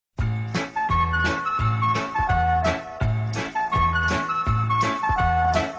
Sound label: Flute; Smurf